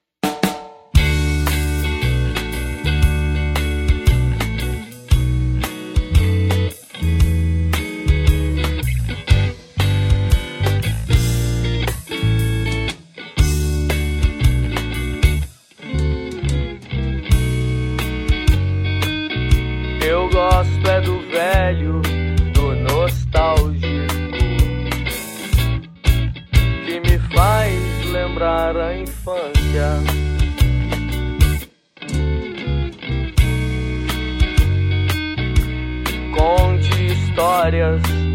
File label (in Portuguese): ensaio ao vivo